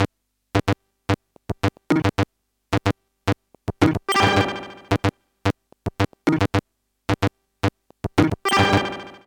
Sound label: psr 37 beat; Beat Patch 1